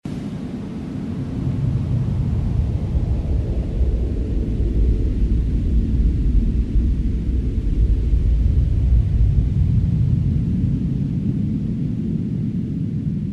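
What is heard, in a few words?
winter forest sounds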